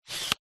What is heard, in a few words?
technology camera dslr lense zoom manual turn canon eos 6d; zapsplat